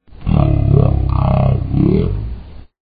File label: penguin yay; vapesquared